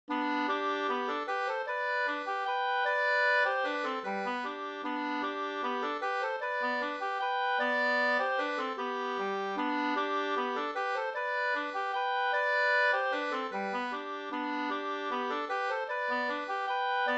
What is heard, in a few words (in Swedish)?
folkdans, no; Attetur l fr Asker 2st